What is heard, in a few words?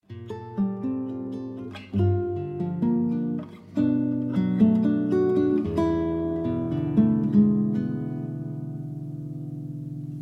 Instrumental